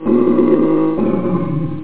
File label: M HURT